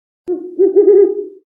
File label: Owl Hoot; Smashy Road Wanted 2 android ios switch gamerip 2020